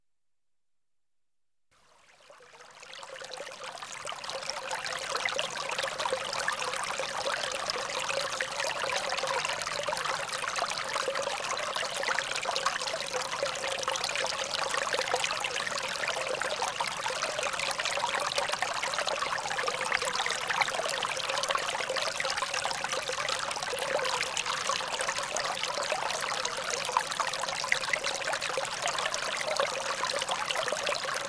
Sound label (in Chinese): 流水; Sound effects 音效